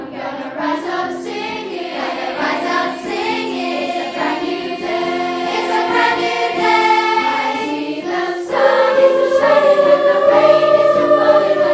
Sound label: Choir Singing; Beechwood Choir